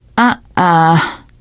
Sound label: negative noise, teen girl, survivor voice; source engine; left 4 dead 2